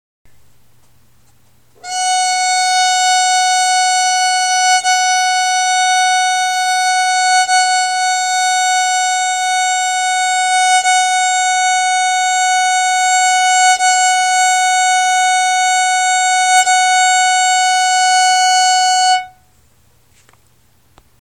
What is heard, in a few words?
fsharp, violin